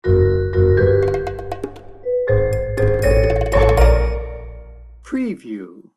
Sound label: quirky pizzicato sting bumper